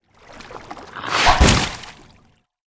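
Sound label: kraken leg attack, monster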